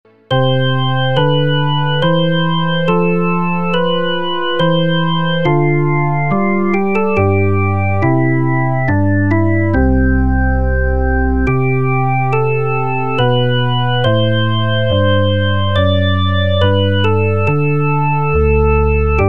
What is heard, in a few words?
organ